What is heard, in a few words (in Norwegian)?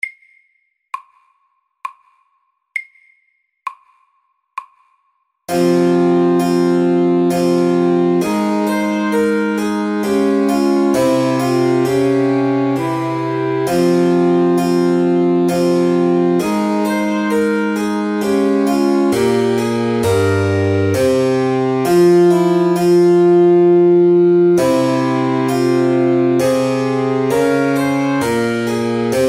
Menuett SAKTE